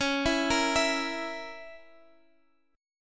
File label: Db Minor 6th Db down Guitar; arpeggiated